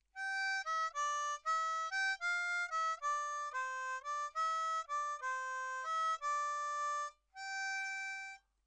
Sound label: 4 Hole Main Melody Half, Harmonica